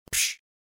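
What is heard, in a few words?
hitstun small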